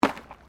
JUNK, Debris

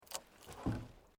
Wood Logs Movement Minor; Material components for constructing more complex sounds; Materials

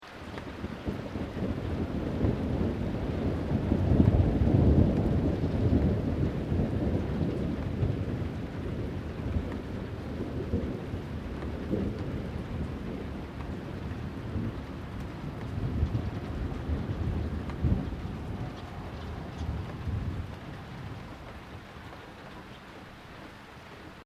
THUNDER

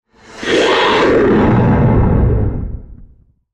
Hit Devils Lightning

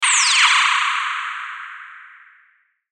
Mouseover Synth Descend Reverb Fast High; Multimedia; Blastwave

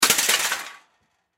Crash Metal Drop Junk Scrap; Crashes (Sound Effects:Objects); Blastwave

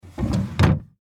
Dresser Drawer Close; Foley; Drawers; Household

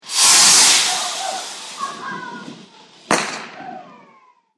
Fireworks Big Whoosh Bang And Laughter; Firework Sound Effects; Celebration, Party

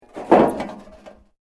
Metal Sheet Move; Materials

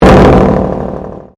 Convolution Pulse

